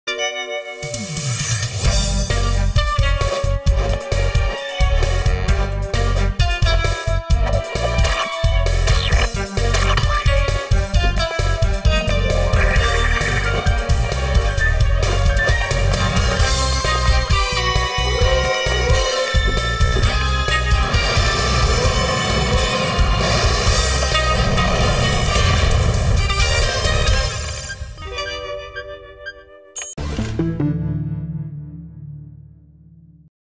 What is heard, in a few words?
Theme Song; Codename Kids Next Door